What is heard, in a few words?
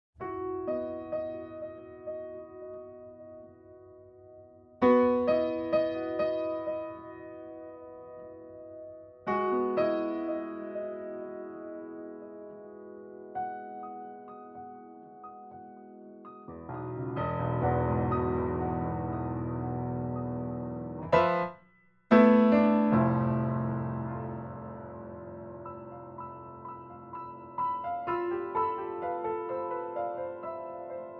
mid mics